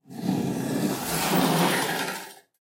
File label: Moving Coffin; soundscrate